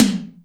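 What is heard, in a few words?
Tom Hi Stereo, Alesis D4 drum machine; 300 Drum Machines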